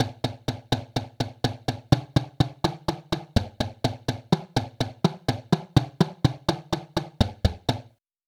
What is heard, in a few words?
woodblock bass